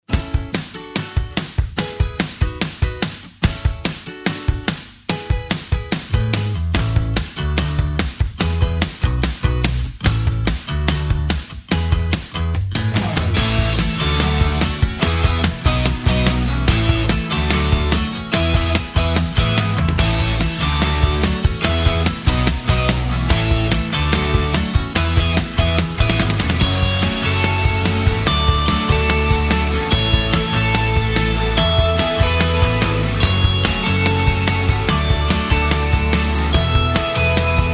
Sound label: rock pop